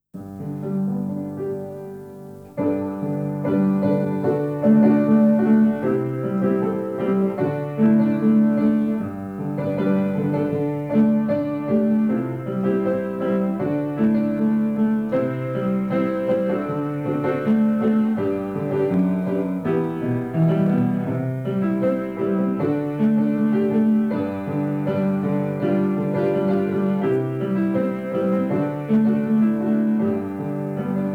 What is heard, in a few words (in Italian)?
pianoforte solo musica; Cantici solo Pianoforte